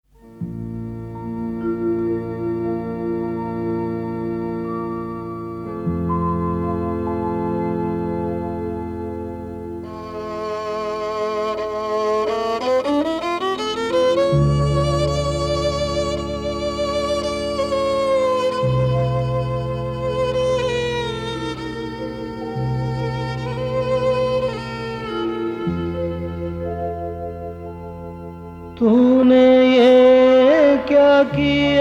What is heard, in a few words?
Sad Sad Version; Sholay